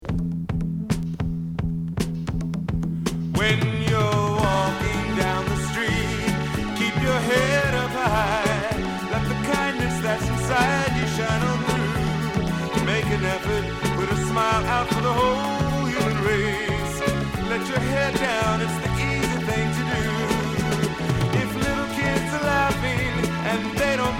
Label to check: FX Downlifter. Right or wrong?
Wrong